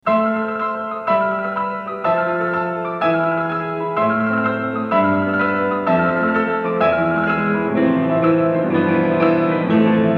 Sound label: Variation IV; MICHELANGELI; BRAHMS; Variations on a theme by Paganini, Op. 35; Book 1